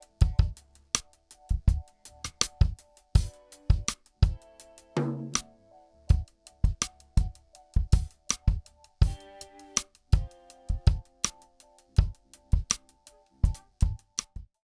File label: Chandler Zener Limiter TG12413 DRUMS COMP2